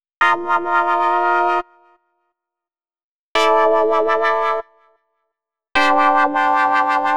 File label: 125 Clavy C, Keys, Nu Jazz House; PowerFX - Nu Jazz House